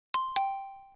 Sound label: Pop Up; SKY; IM-S610K; Camera; System Sounds